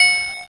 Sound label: ding